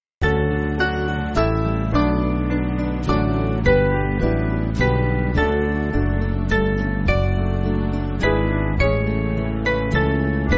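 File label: Band, Free Music Backing Files for Churches; Come Gracious Spirit; Galilee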